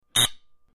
Solenoid Buzzy Rapi, buzz

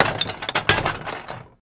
Bottle Being Thrown Into Trash With Cans

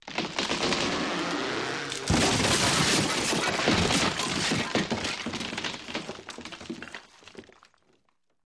Crash Through Wall, Car

